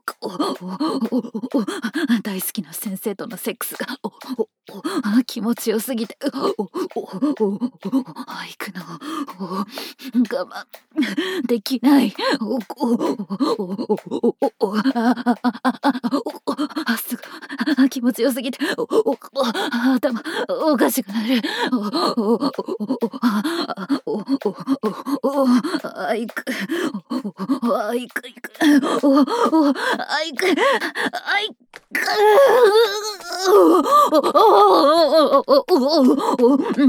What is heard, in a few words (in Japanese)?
耳舐め セックス, ASMR; 耳舐めセックス♡「今から耳を舐めながら犯してやるから覚悟しろ…♡」